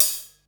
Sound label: RIDE, DRUMS; SoundFactory; SMPMANIA